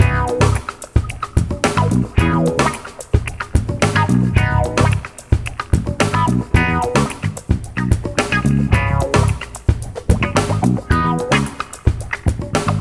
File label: Say Wah solo loop